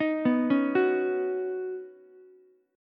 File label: C sharp 7th Suspended 2nd Suspended 4th C sharp down Ukulele; C#7sus2sus4; arpeggiated; JGuitar